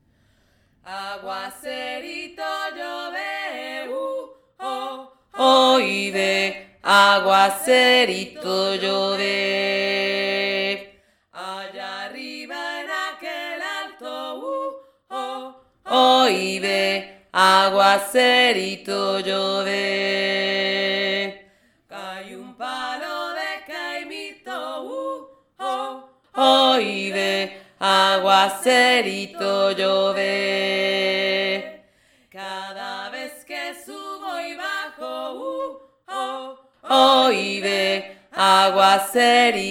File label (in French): VOIX 1